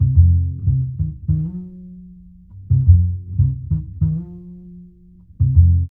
JAZZ BS; E-Lab; X Static Goldmine 2; akai

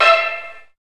VIOLIN

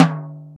R8 TOM

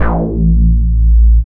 BASS

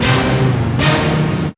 BIG HIT

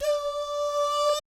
DOO SFZ, Vocal Jazz Fx

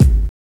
BD; E-Lab; X Static Goldmine 1

